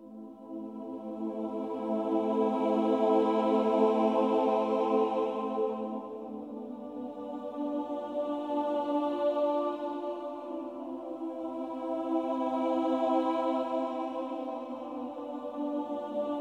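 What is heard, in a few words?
Ambient Requiem Choir only; Shadowgrounds; windows gamerip 2006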